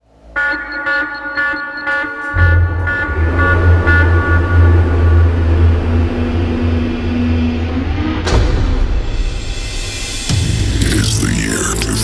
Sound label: hardbass start; dutch; maze